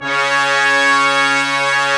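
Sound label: Brass Ensemble mute; Roland L-CD702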